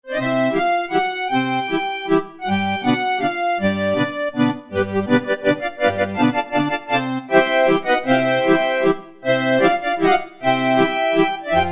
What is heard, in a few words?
Air Reeds